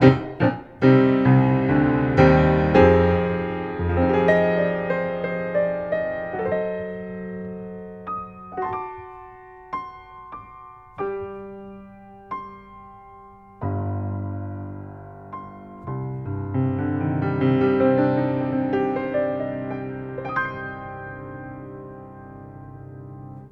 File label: Facets Piano Hammers